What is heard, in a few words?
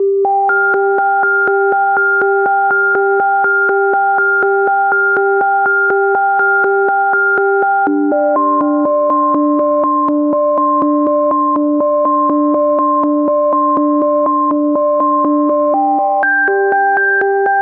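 122 BPM Melodic Arps A major G minor Tonic F; MMB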